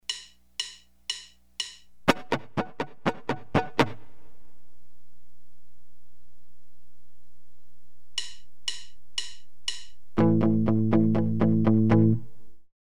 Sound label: Left and right hand muting, guitar